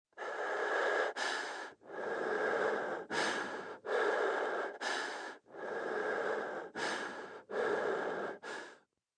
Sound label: Breathing, male, heavy, nervous, stalking (human voice)